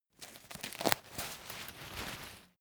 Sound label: umbrella telescopic open velcro